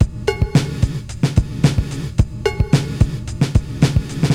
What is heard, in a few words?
80s Gated Verb Korg MR-16 (110BPM), cyborg beats